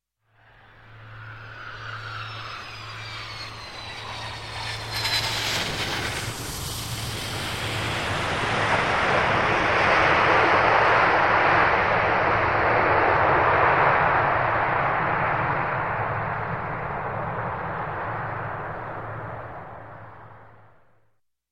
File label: Large Jet Landing; Sound Ideas; Series 2000